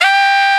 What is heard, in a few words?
SAX, Drum & Bass, 100 BPM; Ueberschall; Drum & Bass Resonance